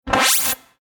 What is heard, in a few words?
Future Telemetry Power Up Short Scifi Digital Interface; Sound Design; Computer Sound Effects